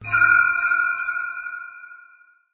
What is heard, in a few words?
amb rand abstract hi; video games; ambient; random abstract; source engine; portal2